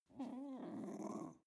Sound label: dog snarl playful